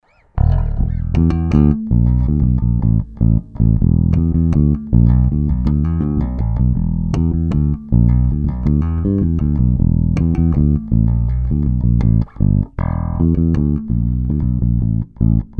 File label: Yamaha TBRX Active Passive Mid Neck Bridge PU